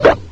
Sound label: pit drone pain; scmod; alienassassin